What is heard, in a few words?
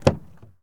Truck Dakota Door Open; Trucks